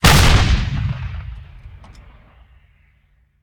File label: Artillery Cannon Artillery; Sound Ideas; Guns